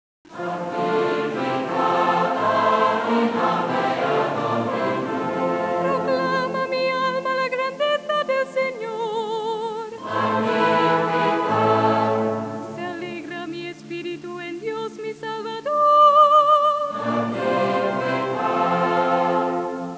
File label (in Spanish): musica